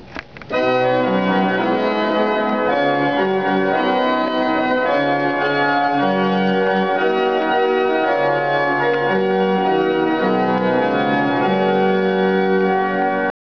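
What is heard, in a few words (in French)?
plein jeu, orgue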